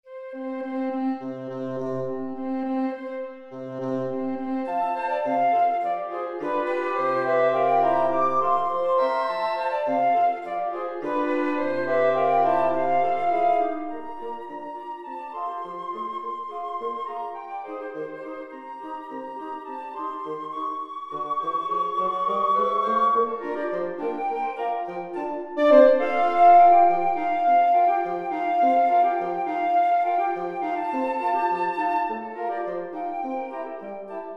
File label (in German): blaeserquintett